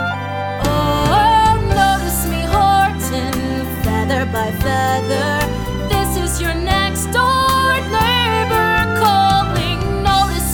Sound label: Vocals; theater